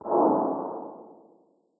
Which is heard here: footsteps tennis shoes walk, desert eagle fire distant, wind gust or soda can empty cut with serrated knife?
desert eagle fire distant